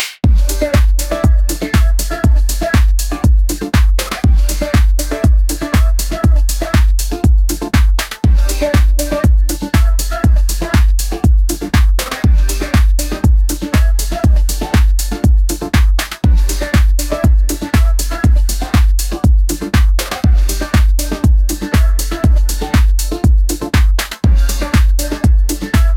disco

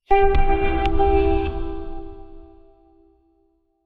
failed to choose, interfaces

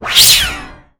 swoosh

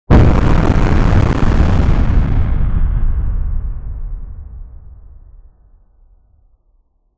sound of fpv drone with

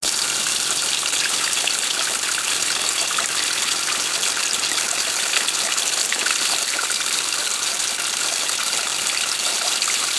the sound of watering a, garden

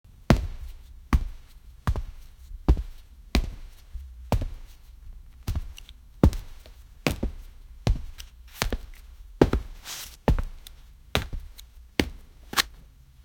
footstep sounds

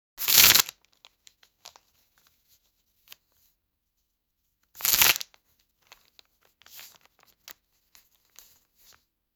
single paper snap photogr; studio

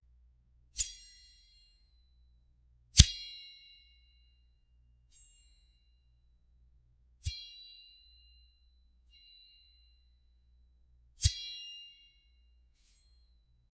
sharp sword cutting throu

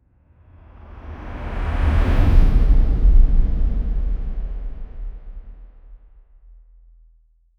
clean platformer landing

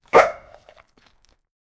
dog pooping explosive dia

